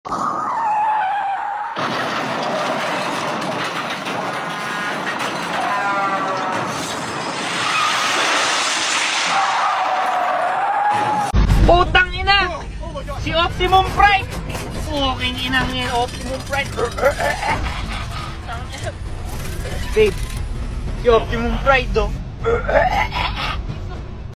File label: car crash skid sound effect